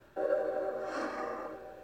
Flamestrike

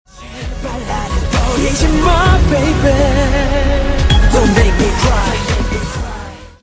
cry

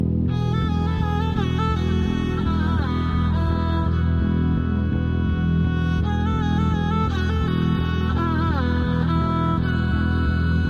instrumental; roja; blues

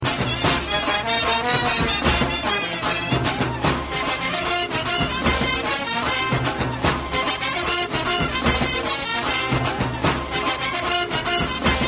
beats, electronic ringtones

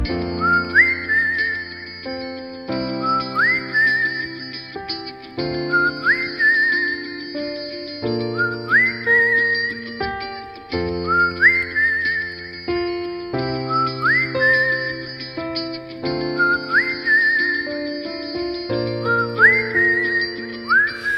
Go Go Whistle

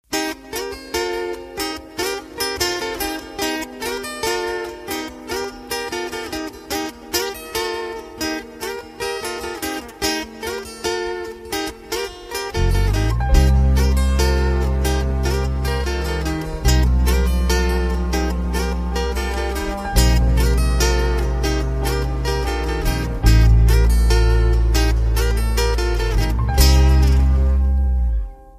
Xylophone Acoustic

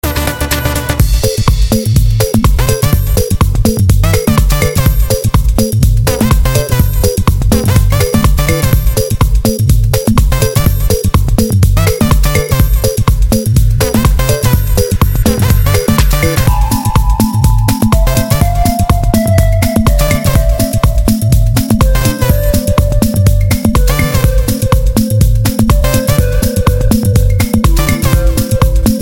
Deep Music

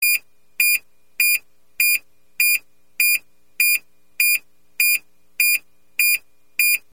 doctor beep, sms; ringtones

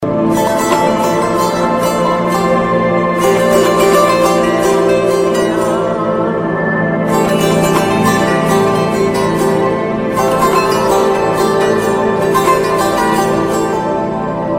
Sarangi

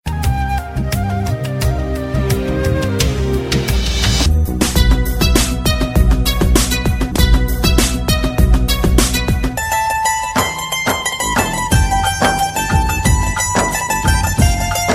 romantic concert ii

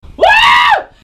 screaming

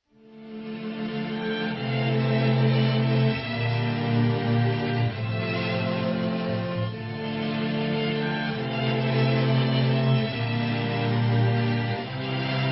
8 melodies

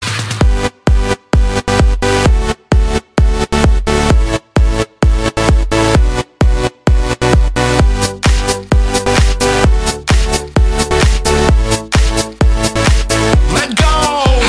Cool House

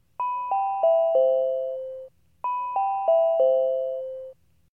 Alarm

